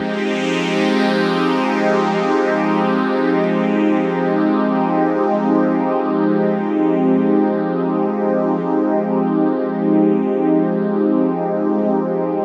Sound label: Pad F2, Club Techno; Zero-G